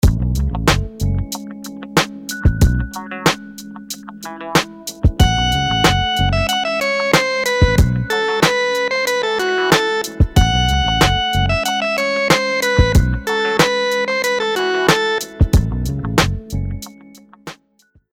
rhythms dotted 8s

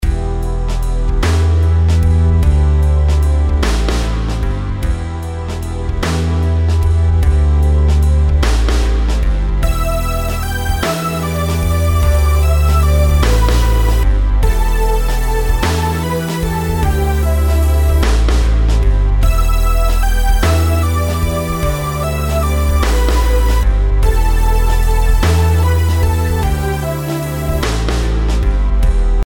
rhythms compound 8s

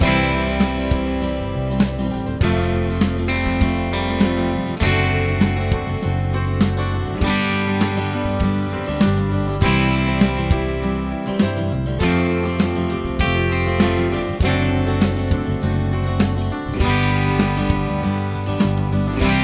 progs with applied chords

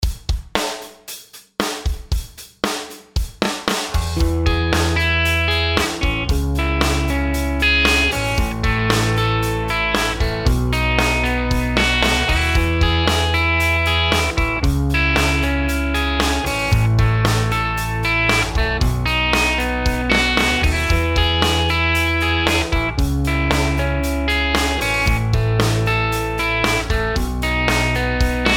chord progs maj root

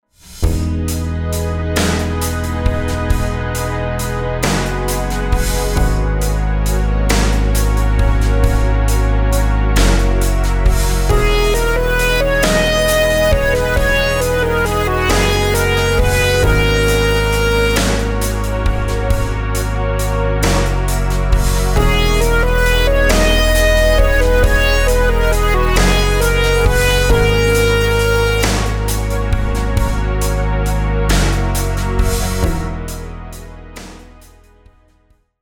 rhythms compound 8s w16s

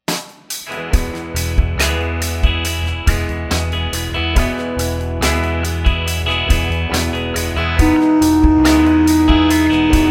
scale degree

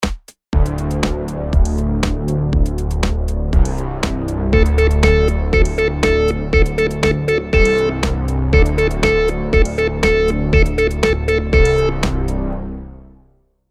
rhythm simple, monotone

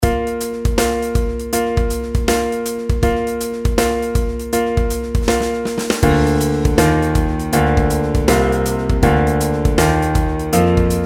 pitch patterns stepwise

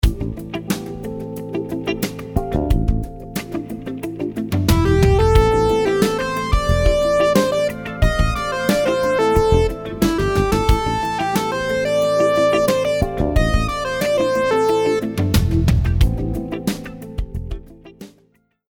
rhythms 16s syncopated

